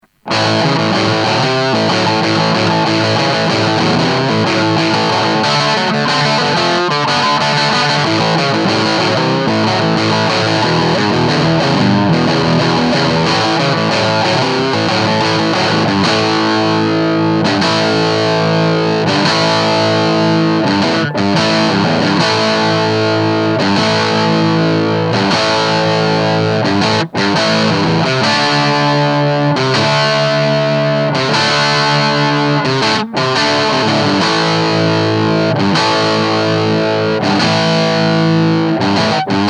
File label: crunch; lampes